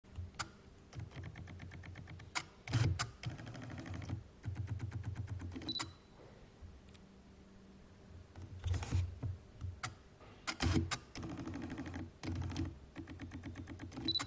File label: Focus lock